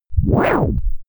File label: stretched whoosh